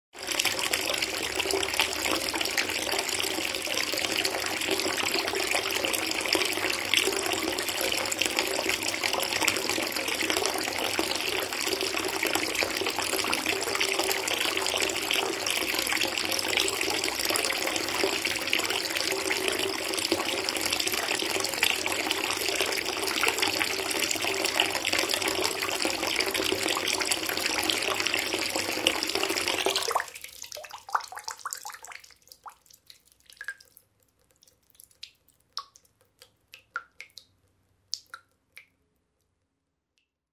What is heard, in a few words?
bath filling; water